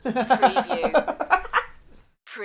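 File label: Man Woodpecker Laugh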